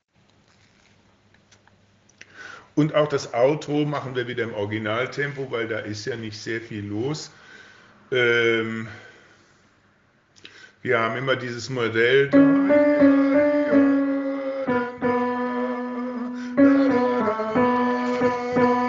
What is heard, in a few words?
Tenor